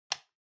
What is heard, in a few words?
Calculator Switch